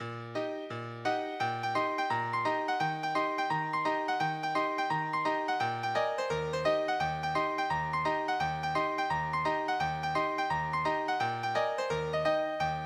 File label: Rag Time; Black Mountain Rag